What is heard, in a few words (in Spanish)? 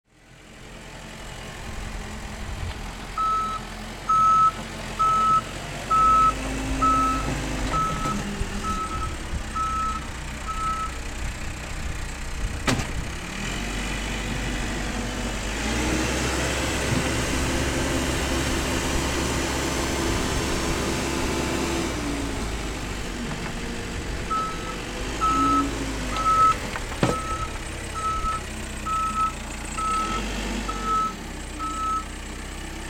retroexcavadoras case mod 580 m; pavon